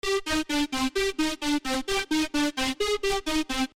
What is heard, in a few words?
LEAD